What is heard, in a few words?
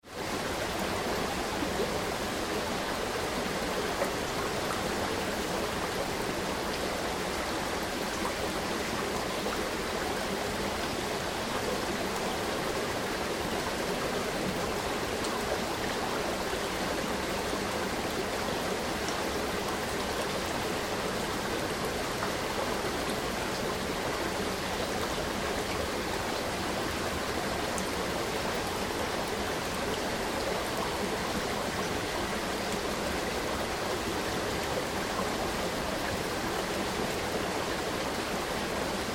Stream Wide and Gentle; Rivers; Nature